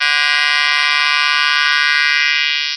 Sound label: annoying, Synth